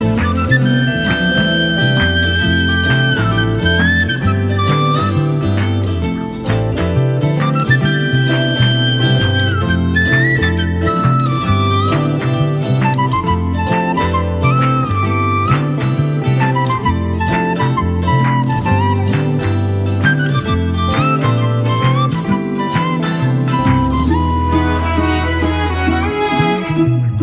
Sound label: bgm; telugu